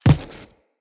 Crew Footstep Default

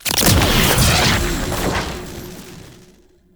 Mirror Portal Activation; Warframe; Duviri Murmur

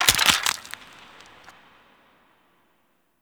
Bone Break; Golden Maw; Warframe; The War Within

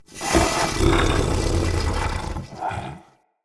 Corrupted Battle Dog Idle Growl, Animals, Vocals

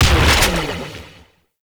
Shot; Warframe; Corvas Prime

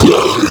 Flying Mouth Pain; Enemies; Warframe; ManInTheWall

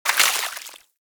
Bullet Impact Debris Water; Warframe; Weapons; Bullet Impacts

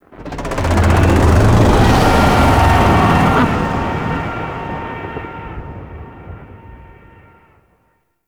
Void Dragon Vocal Distant Idle; Warframe; Duviri

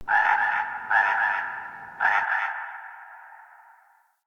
Swamp Croak Distant; Ambience; Midrath Island

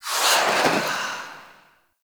Dash Stinger; Dante; Warframe

